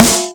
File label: Acousticy Snare